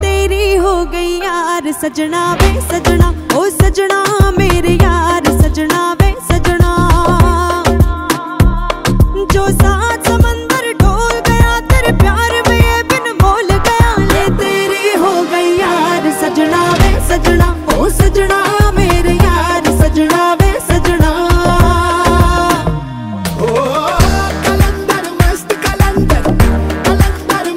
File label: HINDI SONG RINGTONE